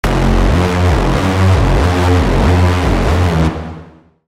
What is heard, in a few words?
Braam - Titan Step; Routed Sound; Vital Expansion - Quantum Enforcer Vol.1